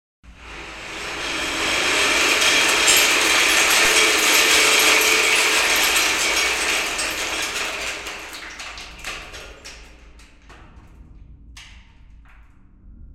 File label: Rainstick